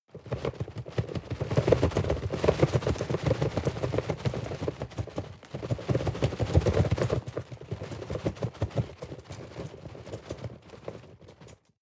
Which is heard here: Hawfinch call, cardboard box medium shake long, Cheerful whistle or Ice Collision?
cardboard box medium shake long